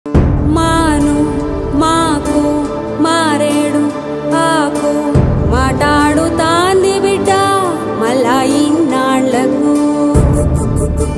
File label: telugu ringtone